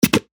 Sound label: PERC D# Fringe, percussion; revealed percussion vol 3